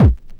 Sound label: KICK, Hip Hop